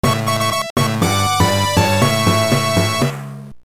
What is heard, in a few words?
1-Up, genesis; 1-Up (2022 Revision) (2-Player)